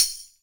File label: Tambourine, drum machines